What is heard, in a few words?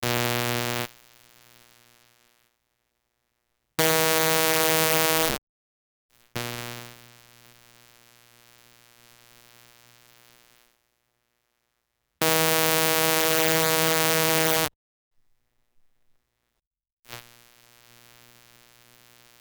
60s cartoon bee buzz